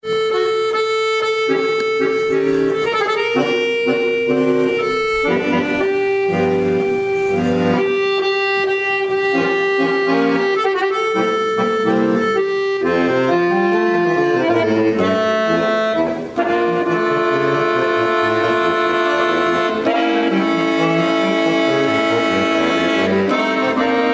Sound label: bayan